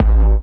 hardbassdrumz, breakcore; Bazzdrumz

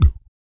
DEEP BREATH; Spectrasonics; Vocal Planet; Blues Soul

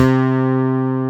MILD CLAV, swing synths; Zero-G - Funky Elements

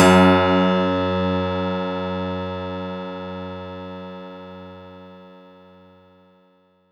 F#2, synth; 90 s Sample CDs; NiceBeats; SynthLine VOL-23

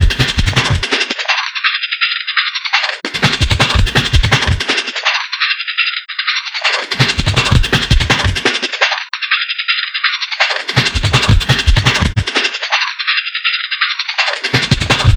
FILTERS, Dark Side Of TripHop; USB Soundscan vol.35; AKAI

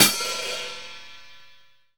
HAT ENDING, VINTAGE FUNK; Zero-G; Funky Elements

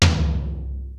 YAMAHA RY30; Rubber Chicken Software Sample Bank

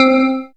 synth c, Top End Tinklers; Dance Synths

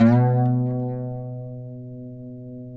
PLK OUD; Roland - LA Composer vol. 3

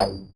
perc, Roland MC-909

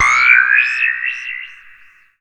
Simple OSC Spring Reverb

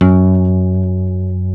F#2C NYLON, Guitars; Spectrasonics; Hans Zimmer Guitars VOL-1

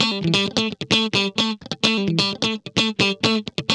GUI, licks; 130 LICKS

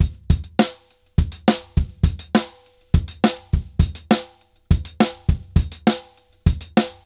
Drums 136, Festa Latina, Drums & Percussion

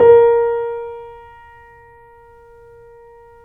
KEY, YC7; Rhythm Section

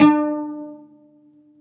UD D 4; SoundBytes; Early Patches

